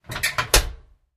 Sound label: Oven Close Door; appliances